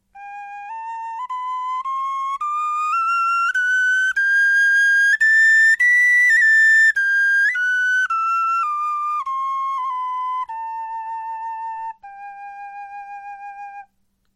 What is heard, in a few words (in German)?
tonleiter